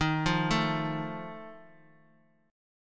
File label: Eb Suspended 2nd Flat 5th Eb 4G 5C 5E 5A